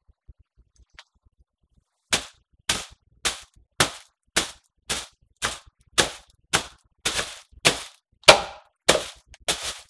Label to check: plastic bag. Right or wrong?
Right